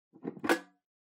Pendulum Clock Hardware Key Remove

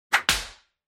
Hit Slap, Dry; 打撃 平手打ち04-1(ドライ)

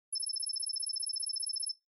Cyber

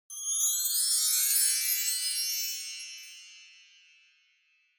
Wind Chimes Slow Up Low

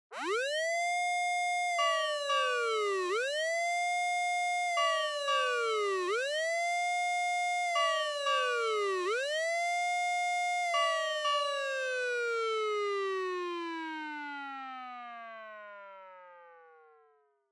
Fire Truck Siren Dry

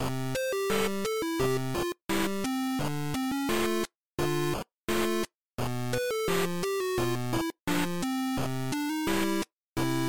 ragtime GB

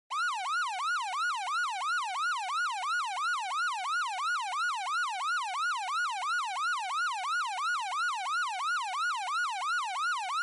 Police Car Siren Dry

